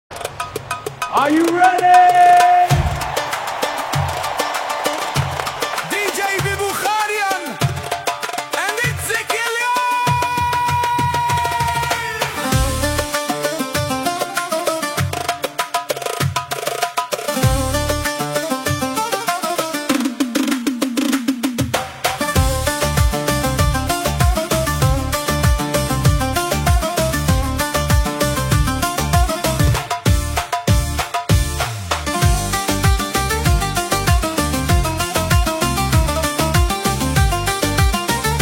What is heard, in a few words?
DARBUKKA ARABIC DANCE REMIX